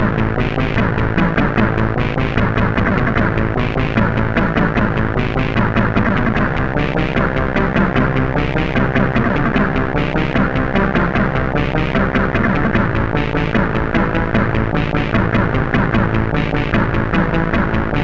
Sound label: Machine, CSG8580; CSG8580R5; hvsc